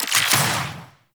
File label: Alchemist Infuse Blast UI; Warframe; Warframes